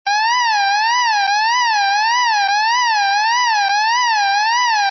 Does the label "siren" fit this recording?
Yes